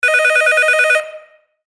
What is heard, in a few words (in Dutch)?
telefoon